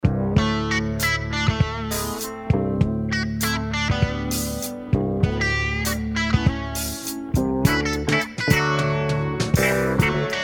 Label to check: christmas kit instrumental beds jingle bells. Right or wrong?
Right